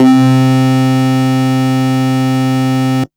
syn, Techno; single-sounds; single-synth; Techno ID

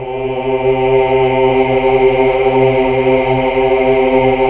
HAL AAH, GREG. AAAH Uni; Sound & Vision - Gigapack II CD 1 (Roland)

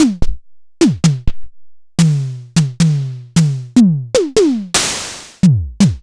Tama TS-206, Samples Sliced; m8

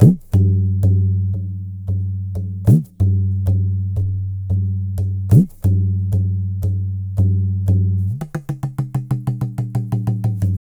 Tabla, 90 BPM; Zero-G; Pure Tabla

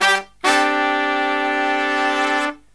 Killer Brass Riffs

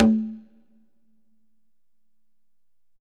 Congas wet, drums percussion

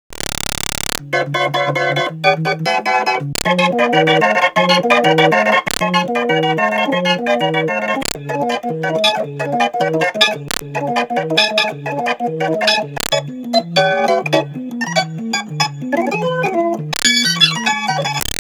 B3 Organ; Masterbits CLIMAX 8

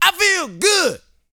VOCAL, R&B Dance, HIP-HOP

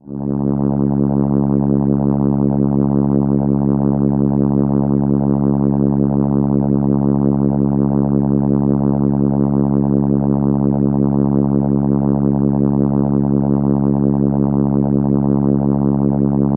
C#4, ARP Solina

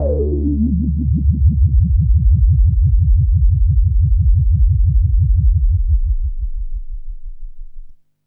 Bass Lick, Drum & Bass; Fluid Dynamics

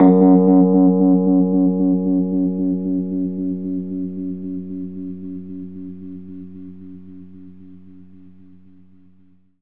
F#, Rhodes, Keys; 3D Sound; 3D Keys VOL-1

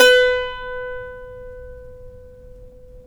saz; Roland - LA Composer vol. 3

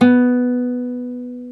GTR nylon; Club-50 - Foundations Roland; Nylon Martin

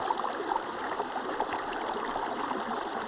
Waterway G Dark

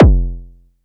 bass 808 beats F; Polyend; ARC Noise

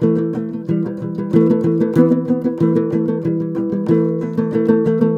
TOPSHUR; Soundscan vol.60; Asian Traditions; AKAI

